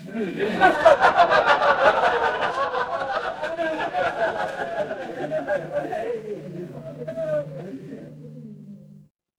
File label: crowd laughter